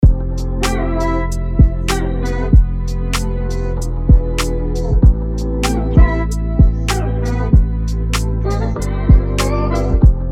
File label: 2000 s hiphop 96; Sample Pack Collection 2025